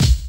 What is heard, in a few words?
BD; Best Service; Dance Mega Drums